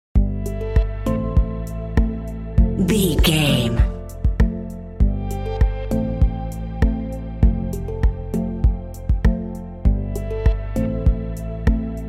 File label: chilled atmospheric metal drum short